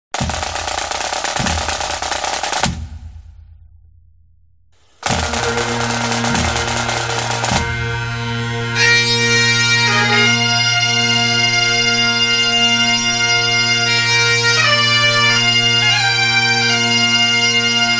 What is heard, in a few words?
Kilted Music; HIGHLANDER